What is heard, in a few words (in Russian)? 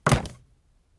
zvuki, gde chelovek spotykaetsia